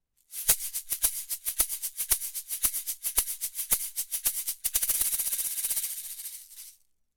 VT-67 Shaker; fame pro series

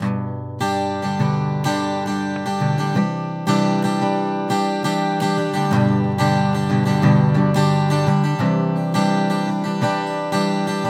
shure motiv mv51 gtr schallloch

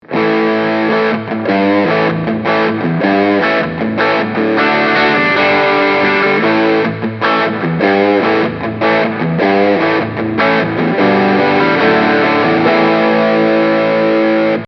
Ibanez Artstar AS153B bridge PU

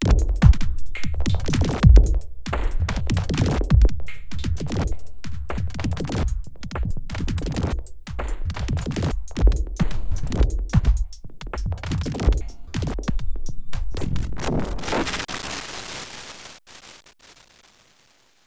chaos generator, Eventide H3000

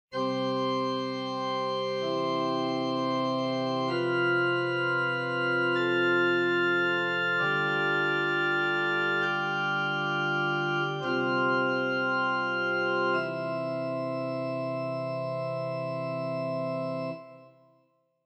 sample pipe organ

